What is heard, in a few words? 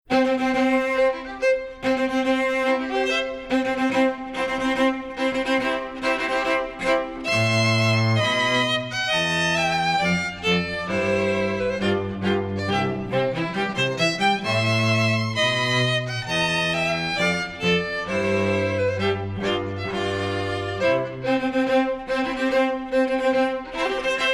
trio wedding march